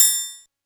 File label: technox perc; Quasimidi Technox